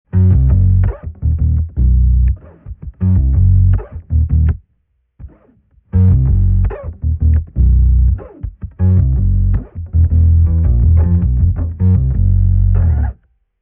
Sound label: Bass Sub Octaver; Quad Cortex